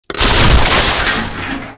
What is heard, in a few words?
objects, World of Tanks